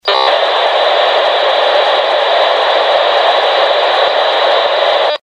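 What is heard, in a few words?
Aegis encrypted Raw; This is a sample of encryption being used on Aegis digital voice.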